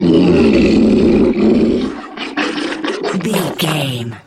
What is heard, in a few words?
troll monster death low